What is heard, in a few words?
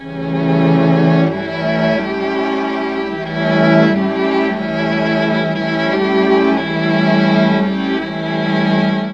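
bass strings, Mellotron; Mike Pinder - Mellotron Archive CD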